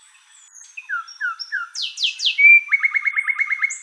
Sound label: wren, thryothorus pleurostictus; bosque seco